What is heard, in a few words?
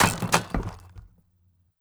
stone construction; Rust